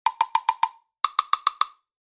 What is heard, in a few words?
iphone 7 woody ringtone